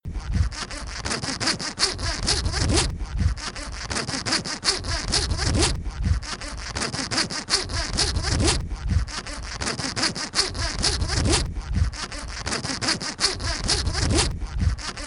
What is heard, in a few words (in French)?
Tuyau contre metal